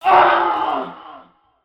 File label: scream